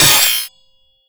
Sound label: manhack grind; Half Life Alyx